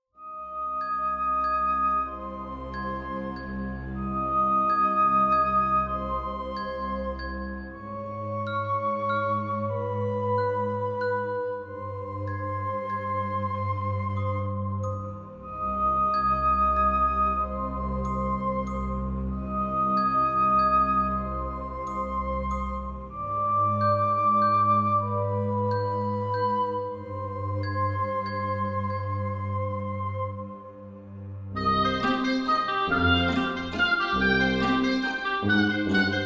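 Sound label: Halloween music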